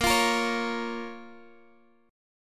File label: F Suspended 4th Sharp 5th Bb